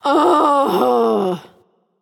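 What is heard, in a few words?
ugh, female; smite; voicepack; hera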